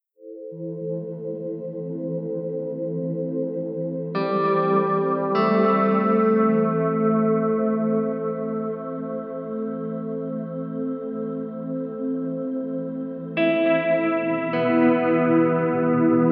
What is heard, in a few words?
high school band breakup; the-afterparty